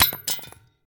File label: grenade metall; STALKER; IWP